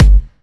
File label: Kicks; Vengeance Samples